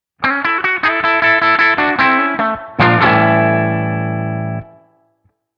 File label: guitar sound